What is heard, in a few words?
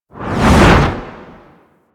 Dodge Roll Right Low; Movement; Warframe; Archwing